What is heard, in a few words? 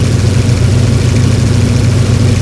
generator diesel medium; AA Dangerous Meltdown - EK Compatible